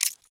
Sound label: button; pork knox